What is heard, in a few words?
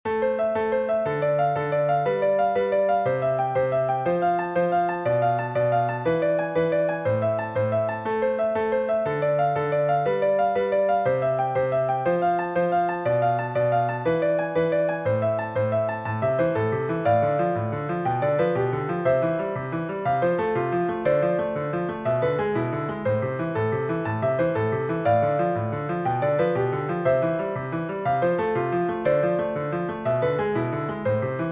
Prelude